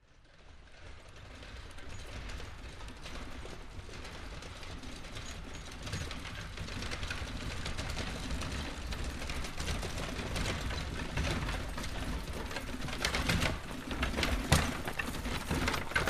Carriage By Very Slow

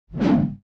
Low Frequency Fast, whooshes

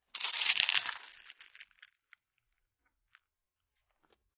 Slower Bite Chips

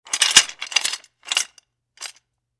Metal Shackle Chain, chains handcuffs & shackles

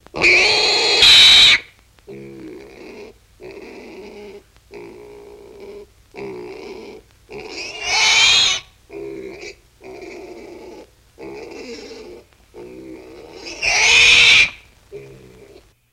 Pig Squeal Breath X3

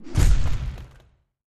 LARGE CREATURE FOOTSTEP, monsters

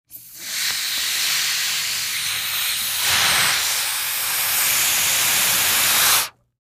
Medium Rubber Balloon; balloons

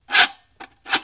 Metal Scrape Medium, scrapes

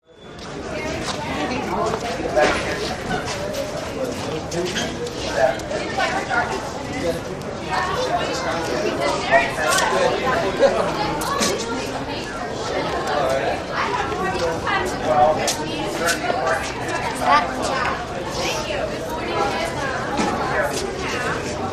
Int Busy Crowd Walla, chatter walla

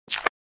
Short Laser Fire Wash

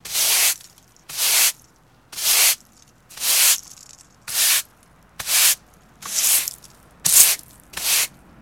Sweep Broom On Cemen; brooms & mops

